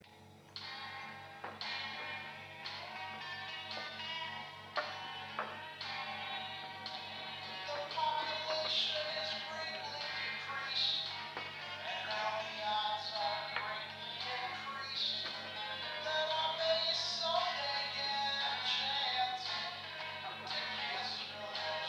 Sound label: Front End Ambience music; full throttle remastered ios linux macos ps vita ps4 windows xbox one gamerip 2017